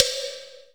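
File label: reverb block; AKAI S6000 CD-ROM - Volume 5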